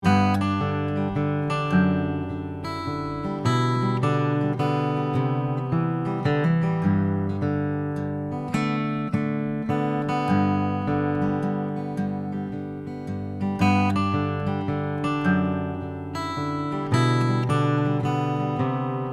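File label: Acoustic Guitar Picking; Extinct Audio BM9; 50cm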